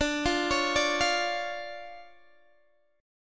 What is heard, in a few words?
D Minor Major 7th D Guitar DADGAD